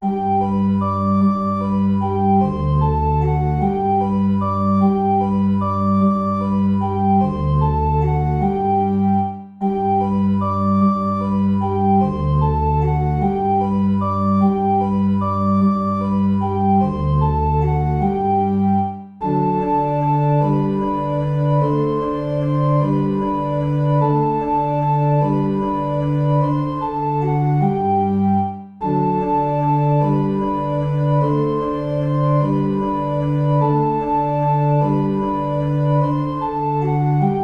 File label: waltz; Carulli Ferdinando